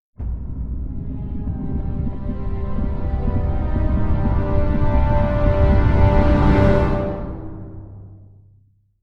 Scifi Audio Logo Ident Ominous Growing Reactor; Stinger; Scifi Audio Logo Ident 04,Ominous,Growing,Reactor.

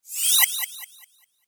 Fantasy Spell, magic sound effect

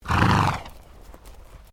ANIMAL HORSE QUARTERHORSE SNORT; Various domestic horses; Animals

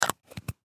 Mousse Can Lid Close; Hair Salon Barber Household; Primping Sound Effects; Human; Foley

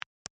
Smart Phone Button, Push; Technology; Cell Phone Sound Effects; BlastWave

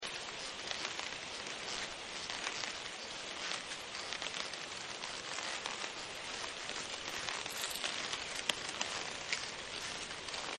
Riding A Camel; Camels; Animals

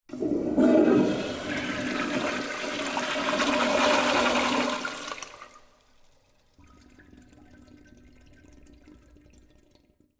Toilet Flush In Public Restroom; Bathroom; Foley; SoundEffects-Blastwave

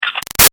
Phone Cell Camera Click Motorola; Motorola Krazor; Cell Phones; Electronics

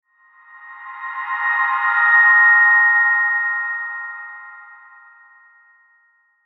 SHIMMER RING; Shimmer Ring Pan High; High Tone; Blastwave

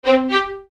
CHIME STRINGS; Chimes (Sound Effects:Multimedia)

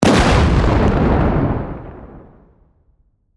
Explosion Mortar, Complex, Crunchy; Warfare; Bombs; Blast Wave

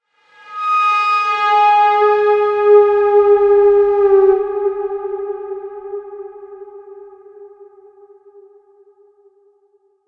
Sound Design-Pressurized Air Release (Reverb); Sound Design - Pressurized Air Or Steam Release From Pipe With Reverb; Hisses; Objects; McKinney Sound

